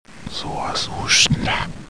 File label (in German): Baum; CyberMycha 2002 August; PARK